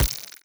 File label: step layer, headcrab black movement